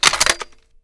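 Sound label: sniper ads rechamber; gmod; iw8; alpha50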